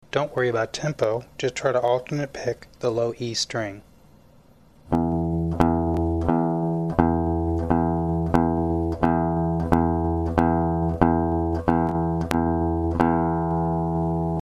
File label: example 1, guitar; alternate picking; Play the audio and I will walk you through it. Don't worry about tempo or timing with this, just get used to the feel of alternate picking.